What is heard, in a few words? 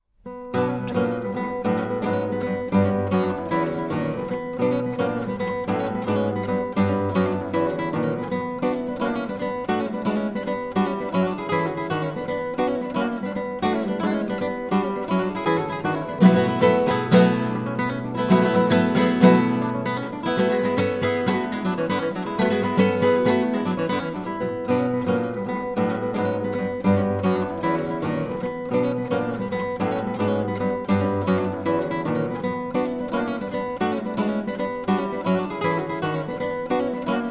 Ethno Dance